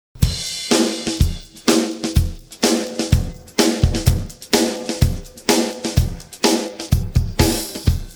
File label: drums pattern